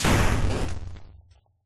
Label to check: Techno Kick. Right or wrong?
Wrong